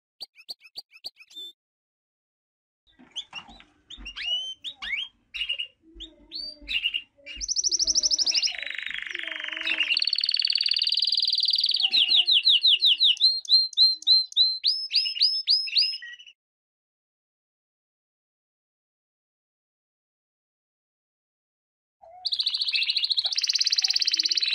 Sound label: Canary, Bird Songs